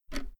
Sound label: tick